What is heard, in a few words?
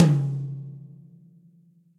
Tom Mid Session Dry, Drum Hits; Standard Hits; Ableton; Factory Packs; Session Drums Studio